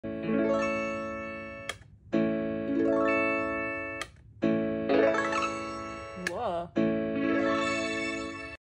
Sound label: Omnichord